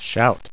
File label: shout